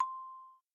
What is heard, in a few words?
Instrument